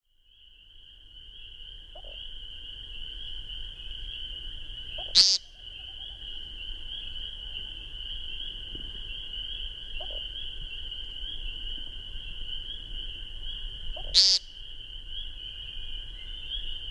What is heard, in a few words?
American Woodcock